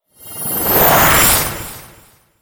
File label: combo Tease; netent